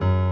piano low

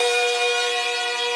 synth main

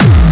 Gabba Kick

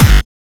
drum

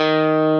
Arp.

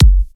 soft hit whistle

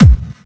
hardbass

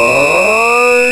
drum slider whistle; osu

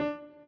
chord in d

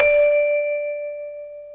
Bell D5

